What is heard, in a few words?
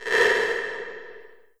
LH R808, REVRB LARGE HALL